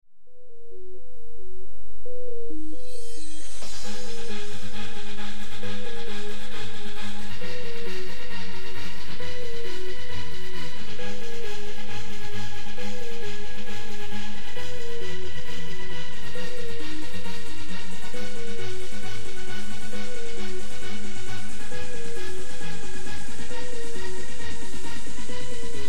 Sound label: techno melodie